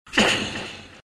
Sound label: pain; ancient apparition